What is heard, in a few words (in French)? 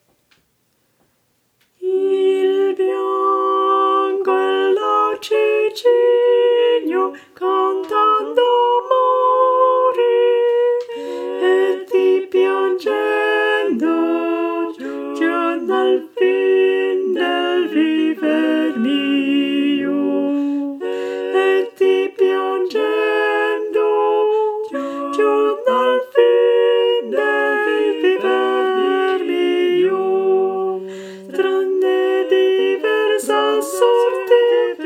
sopranes; Il bianco e dolce cigno